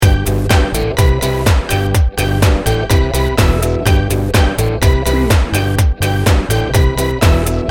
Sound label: beach party loop